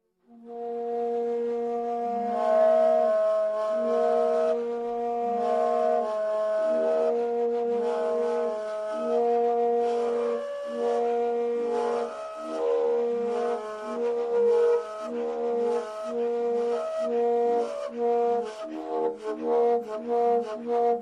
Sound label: Sepik Flutes